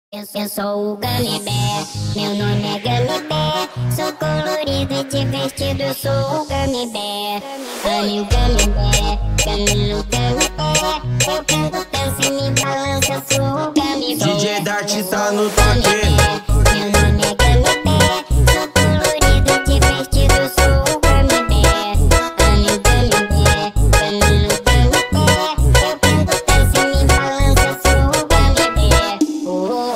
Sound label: Beat funk remix